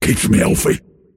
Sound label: deny pain; pudge